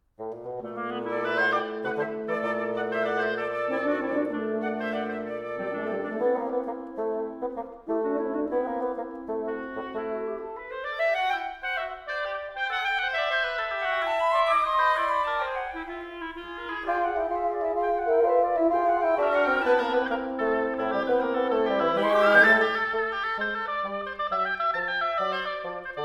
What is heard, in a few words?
Winter Music Allegro Scherzando